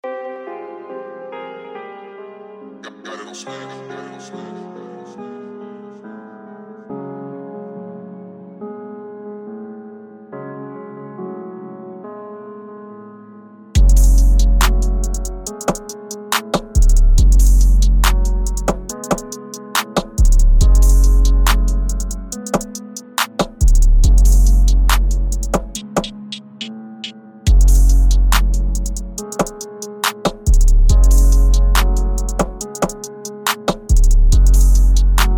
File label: Instrumental